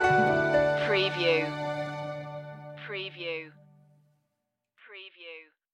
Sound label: summer adventures stinger